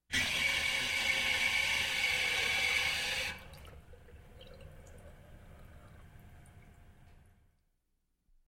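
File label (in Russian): питьевой фонтанчик включают и выключают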